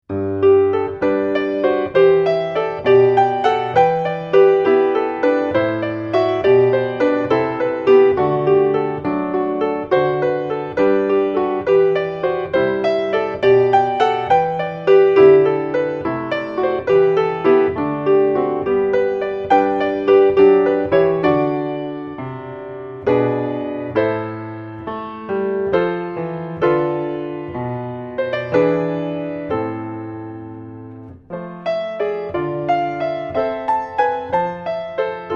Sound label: Jesu joy, solo piano; wedding music